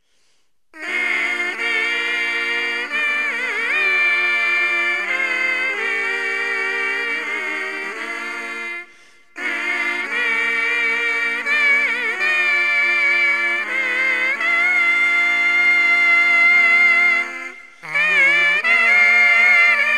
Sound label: Mouth Piece Quartet